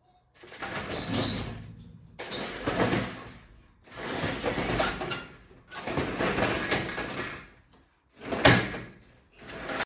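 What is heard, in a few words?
opening roller shutter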